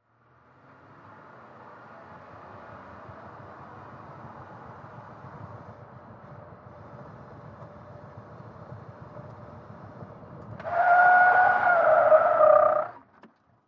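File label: SUV Truck 180 Skid Mix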